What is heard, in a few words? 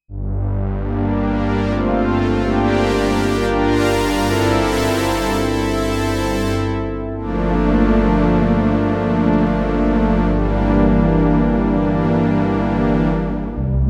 pads